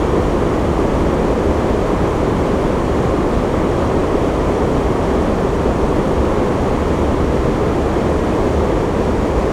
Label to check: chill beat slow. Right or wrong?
Wrong